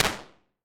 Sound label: Empty Basement, Impulse Responses; Small Spaces; Indoor Spaces; Apple